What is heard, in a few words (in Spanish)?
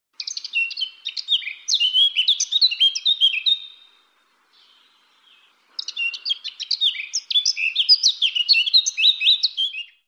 curruca capirotada